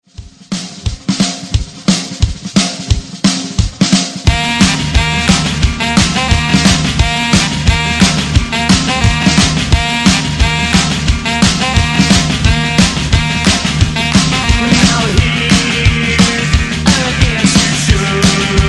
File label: 80s ReDrum 176 Bpm; DJDOLLS